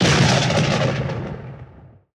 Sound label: Thunder; Dumbledore